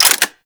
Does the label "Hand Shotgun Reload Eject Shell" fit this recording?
Yes